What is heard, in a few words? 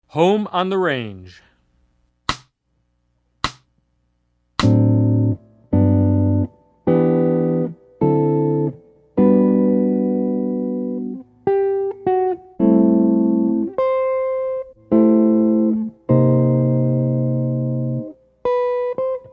Jazz; jue shi ji ta